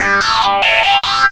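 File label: guitar loop, 145 BPM, drum & bass; Ueberschall; Drum & Bass Flash Trackz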